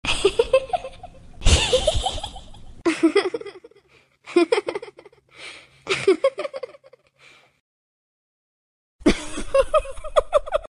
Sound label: laugh